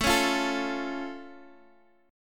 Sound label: A Augmented A, Guitar Half Step Down